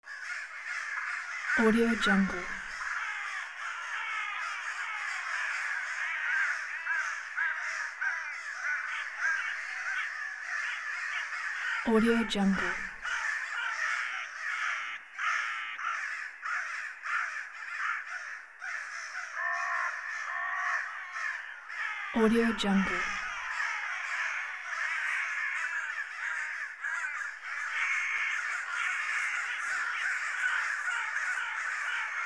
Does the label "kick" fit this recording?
No